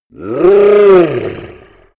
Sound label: yeti growl struggle